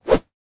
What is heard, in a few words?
popup close